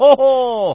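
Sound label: laughter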